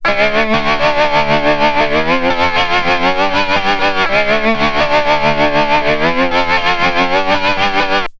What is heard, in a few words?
steel gtr devtronix